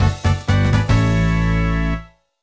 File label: Jig; eigo de tabisuru little charo